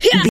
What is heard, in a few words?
voice female attack